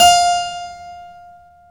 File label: KEY HRPCR; Roland - LA Composer vol. 1